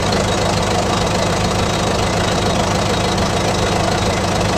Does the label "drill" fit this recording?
Yes